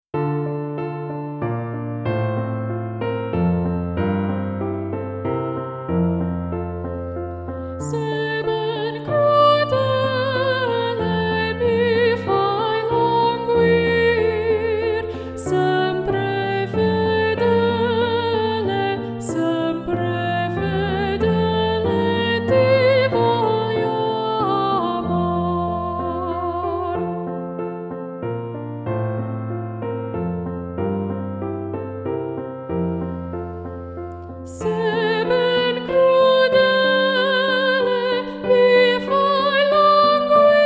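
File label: sebben crudele, d minor, chorus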